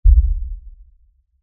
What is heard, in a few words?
Impact Lfe Rumble; Low Impacts (Sound Effects:Impacts); Blastwave